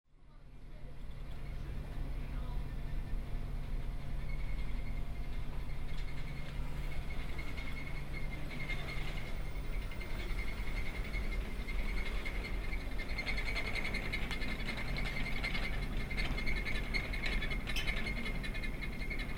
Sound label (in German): teller scheppern